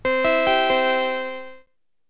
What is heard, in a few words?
gong; metro